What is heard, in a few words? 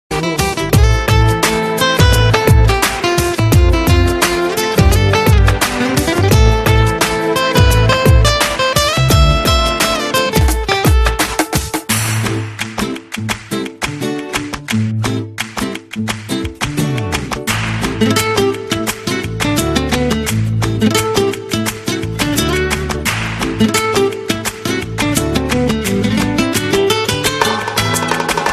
music bit